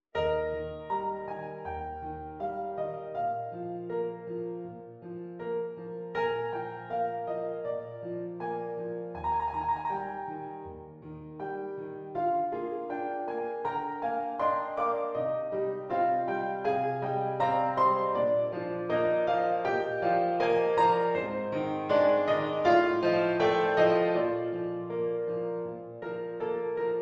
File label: Piano; Vivaldi; winter concerto no 4 in f minor op 8 rv 297 l inverno